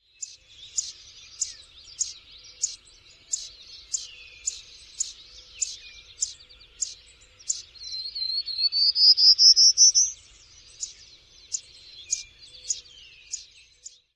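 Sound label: chip and song; NY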